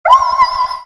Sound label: scrm